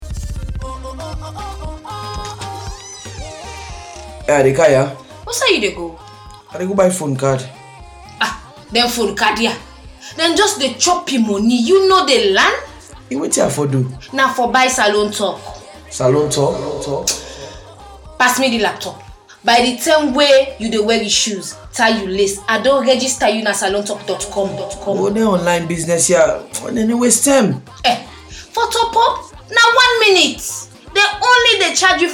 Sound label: Jingle; Salone Talk